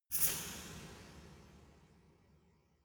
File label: resonator hit double soft